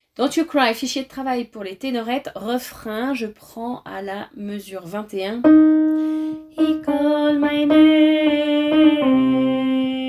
refrain tenorette; Dont you cry

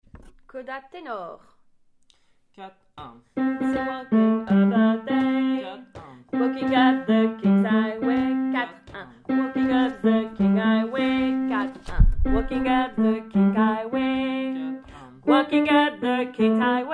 It's a highway to heaven coda tenor

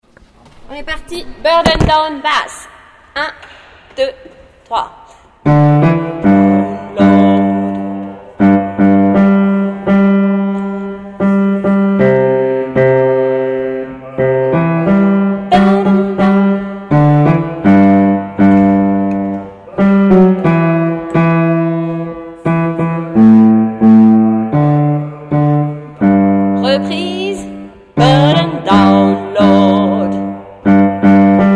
Burden down basse

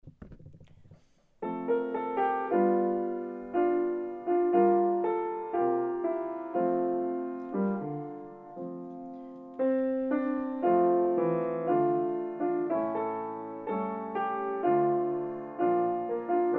I've got peace like a river accompagnement

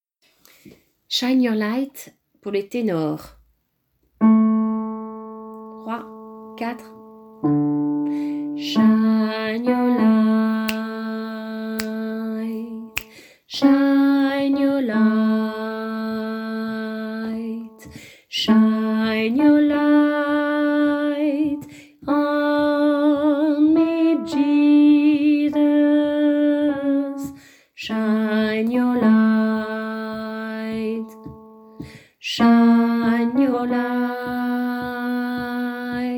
refrain tenor

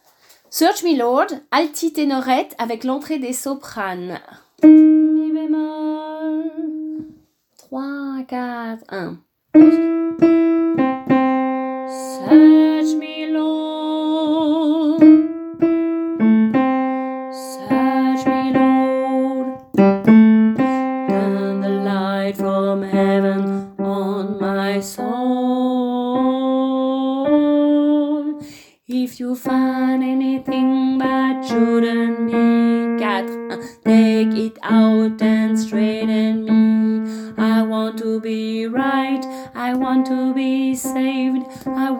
Search me Lord Alti Tenor

